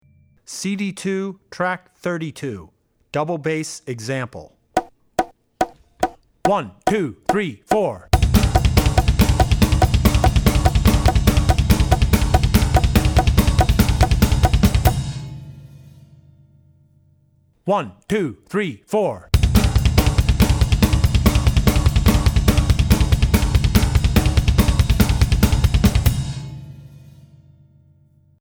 Heavy Metal - Double Bass; Drummer's Bible Tracks

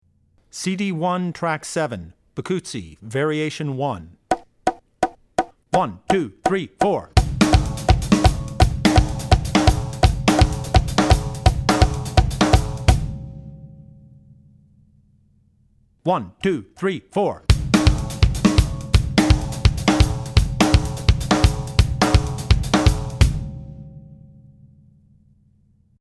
Bikutsi; CD1 Track7 Bikutsi (Variation 1); Drummer's Bible Tracks

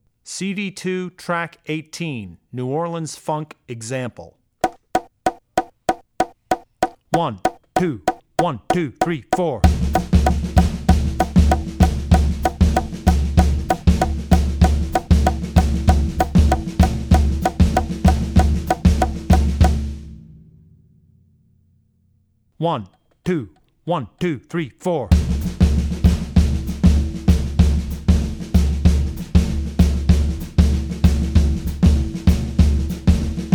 Funk New Orleans Funk; Drummer's Bible Tracks

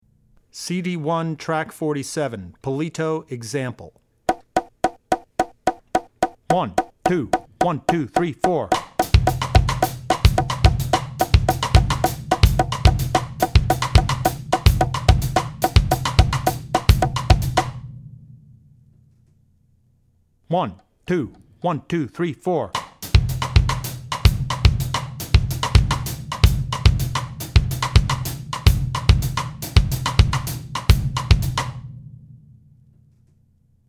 Palito; Drummer's Bible Tracks

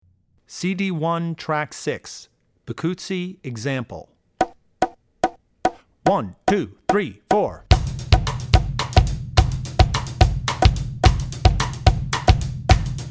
Bikutsi; Drummer's Bible Tracks

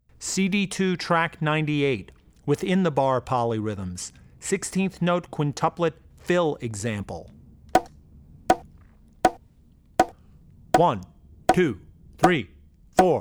Polyrhythms - Within The Bar 16ths (Fill); Drummer's Bible Tracks

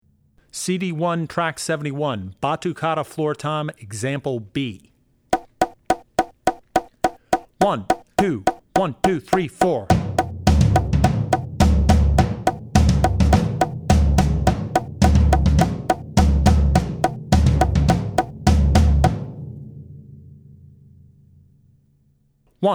Batukada Floor Tom; Drummer's Bible Tracks

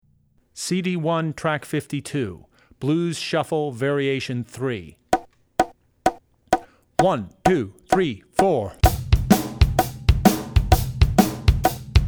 Shuffle (Variation 3); Drummer's Bible Tracks

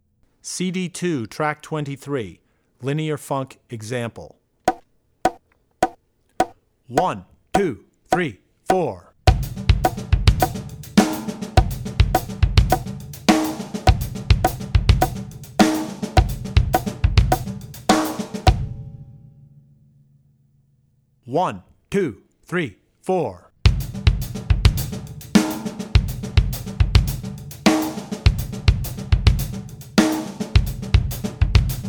Funk - Linear Funk; Drummer's Bible Tracks

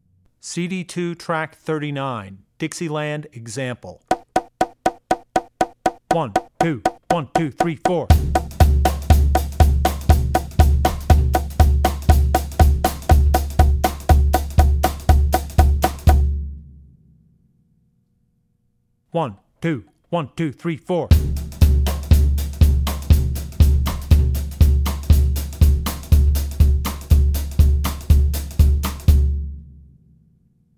Jazz - Dixieland; Drummer's Bible Tracks

